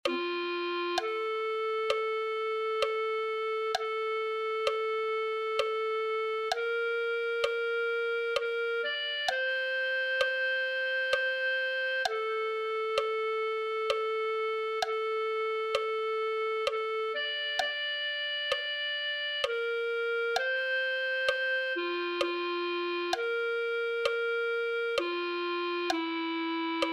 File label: clarinet